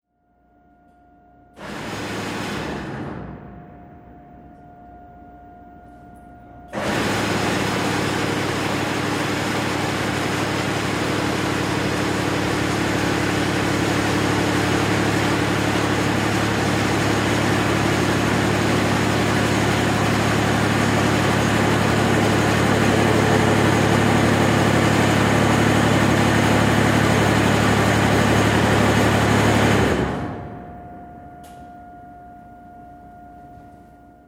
Ship Oil Pipeline Service Vessel Compressor Start Run and Off; Tom Hutchings